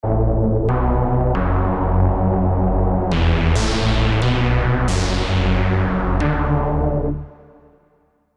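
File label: synth Amelie Lead, Spire; spire old skool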